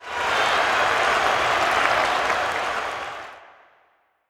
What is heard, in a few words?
Cheer; Pac-Man Championship Edition 2 Plus